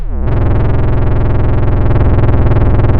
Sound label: pop, chainsaw